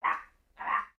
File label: Pets Skunk Idle Short; creatures pets; Lego